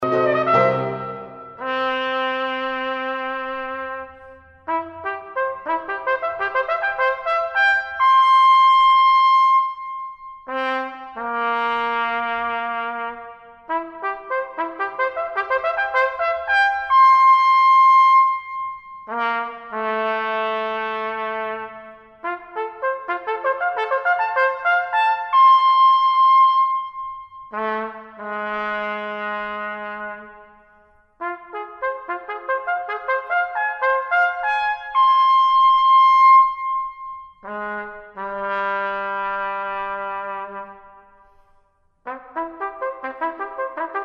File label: Cadenza; SoundsFromHudson